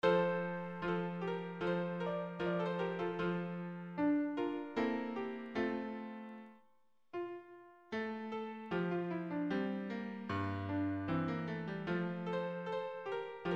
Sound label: Regina Coeli K108 PRELUDE; 2. Tempo moderato; piano & solo; Mozart